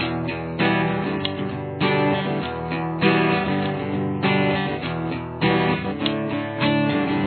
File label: chorus, guitar